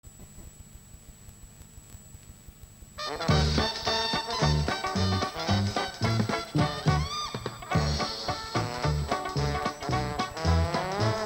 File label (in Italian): Oh, Susanna strumentale